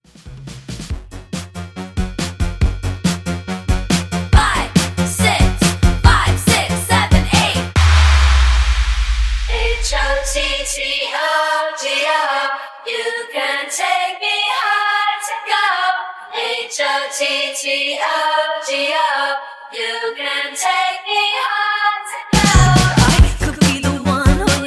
Epic Intro; Remixfreakz

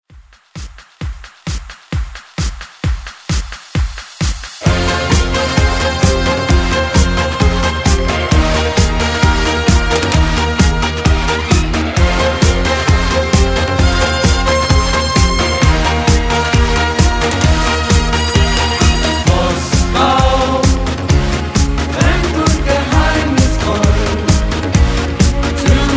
70s ReDrum 131Bpm; DJDOLLS

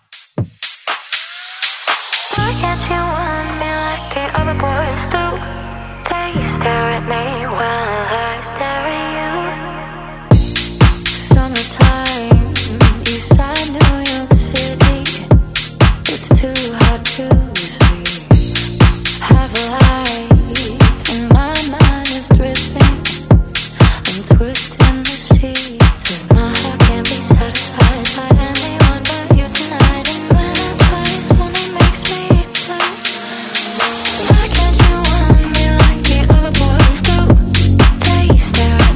Dance Redrum

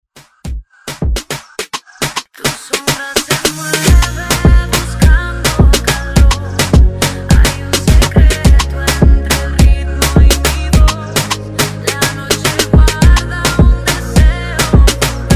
Afrobeat Redrum

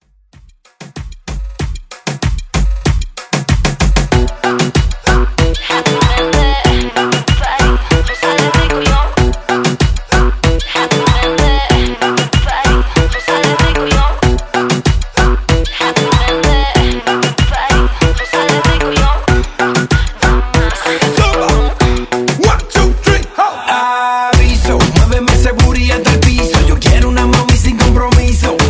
Reggaeton; Redrum

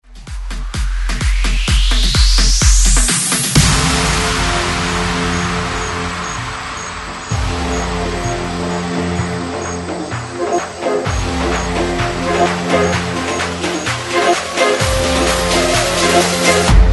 Remix Break Mix 130 Bpm; DJDOLLS

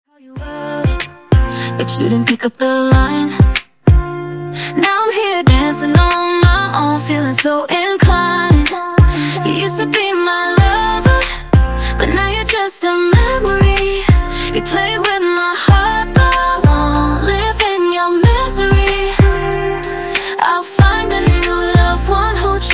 Pop Mainstream 94 Bpm; Dj Intro Outro Clean

